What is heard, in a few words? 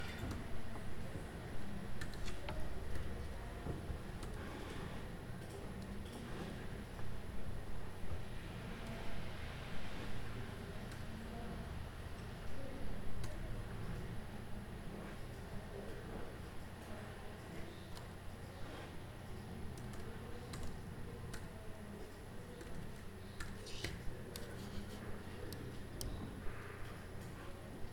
Small Office